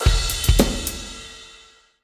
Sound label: defeat drums; smite; god raijin